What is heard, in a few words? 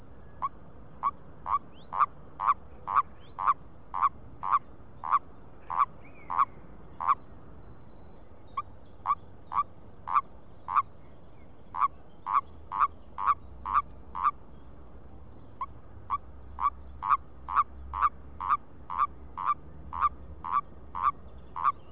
bufo bufo